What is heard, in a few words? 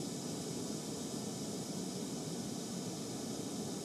Ambience Loop, outdoor